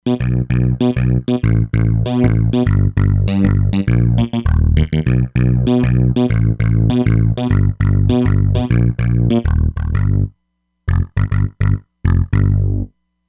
Reso Bass, E-mu Proteus-3; Factory patches

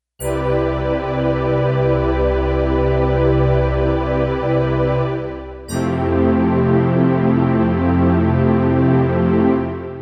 Pad New Age Pad, synth

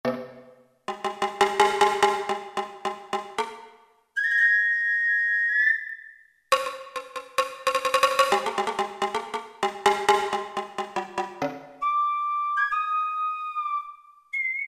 Japanese Duo; Roland PN-D50-02

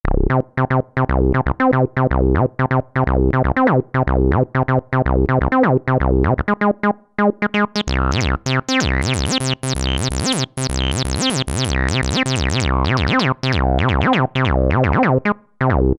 Bass, Alesis Micron; Old Favorite; Factory Programs

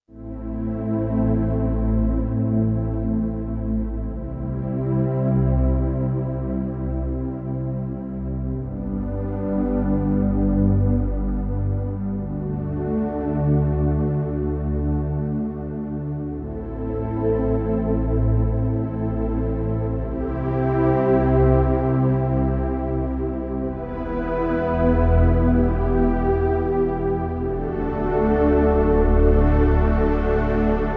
Pad; Kurzweil K2000 Orchestral ROM

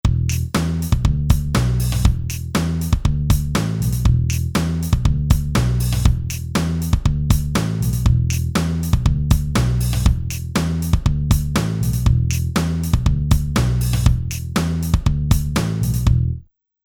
Rap Hip Hop

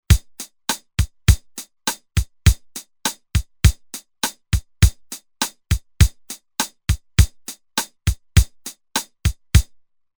8 Beat Rock, Roland TR-505; Factory Rhythms

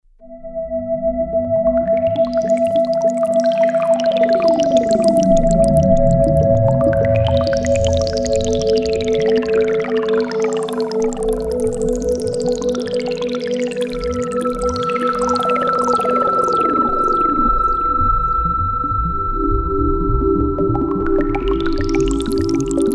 MOOGULAR, synth

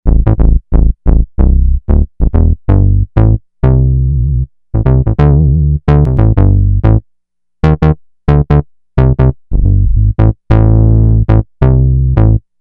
Prophet 5 Bass

